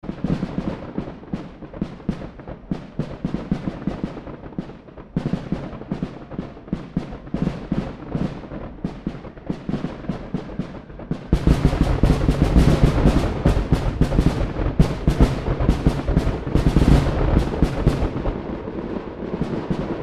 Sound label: fireworks the finale; fireworks in greenfield wi; using ZoomH4